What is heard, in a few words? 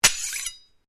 Blade Swish Low, Sword